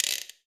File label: sfx perc